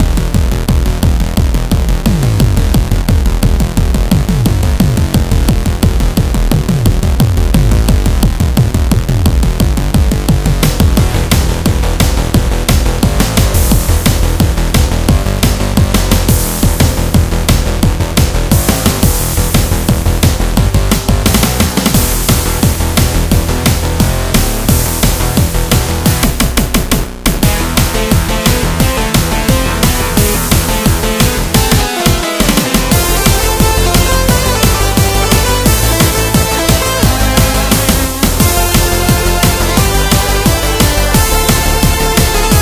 death metal; crypt of the necrodancer

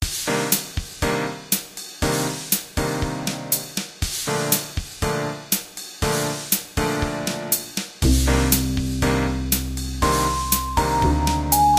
elevator

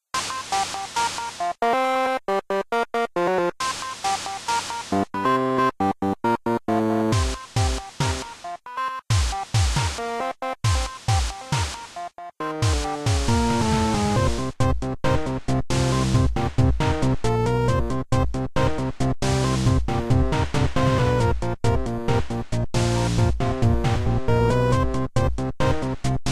people of the root internal percussion; cave story

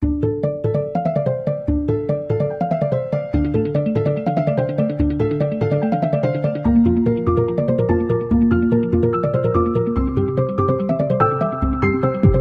piano

